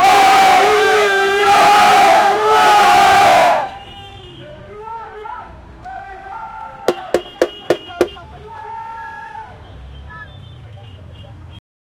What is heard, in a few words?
angry mob in chennai; protest